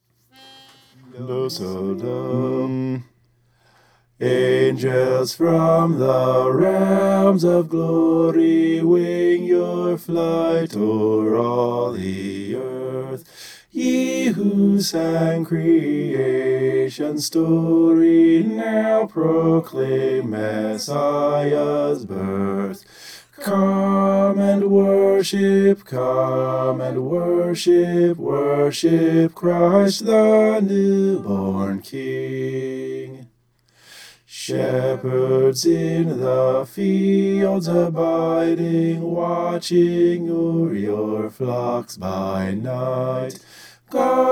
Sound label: bass